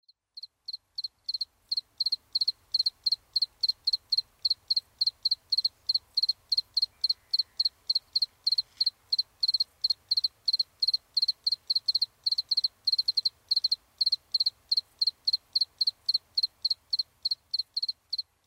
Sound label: house cricket